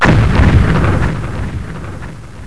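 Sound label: lightning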